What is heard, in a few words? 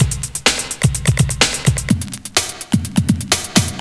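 Shift touch loop; Urban Shakedown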